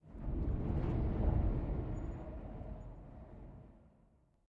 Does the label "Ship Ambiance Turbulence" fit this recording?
Yes